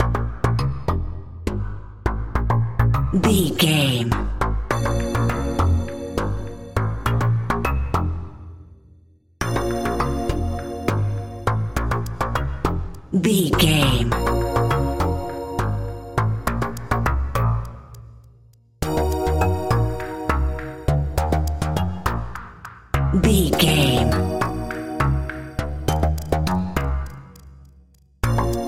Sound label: mysterious task video game narrative